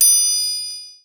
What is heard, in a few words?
percussion special